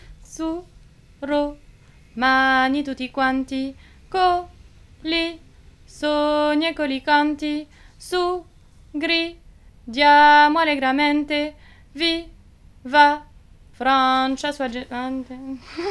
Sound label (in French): Liberte SOPRANE; St Jean